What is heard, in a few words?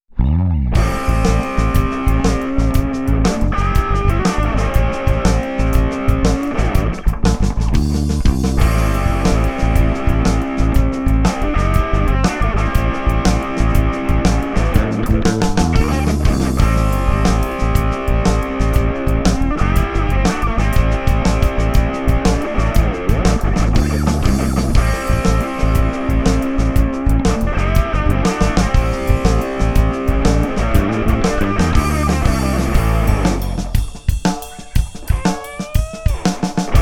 Shuffle